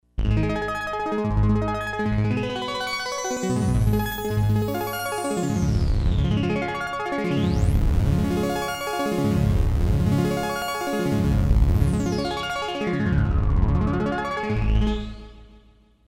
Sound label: Arplet; Kris Rhen Presets